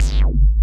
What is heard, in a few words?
PULSE BASS; Pro-Rec - Synthsonic; BASSES